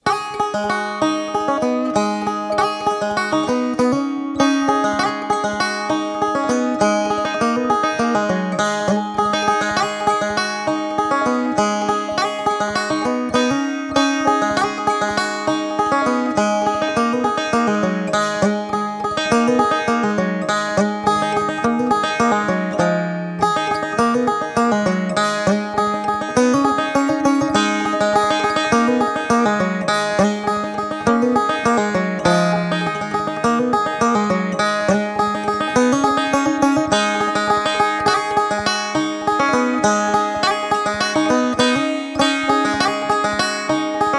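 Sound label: Appalachian Country; Applachian Country; Anger Music Library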